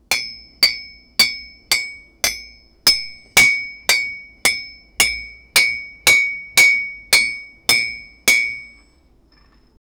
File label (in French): forgeron qui tape sur son, work